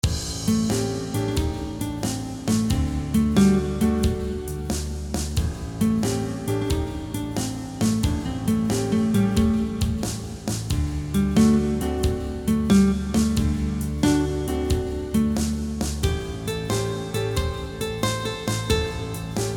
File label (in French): blues facile en la avec permettant improvisation